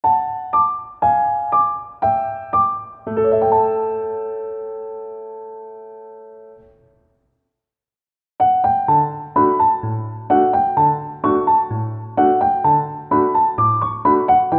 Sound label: piano